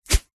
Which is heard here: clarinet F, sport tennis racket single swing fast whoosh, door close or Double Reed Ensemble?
sport tennis racket single swing fast whoosh